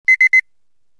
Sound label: Short Beep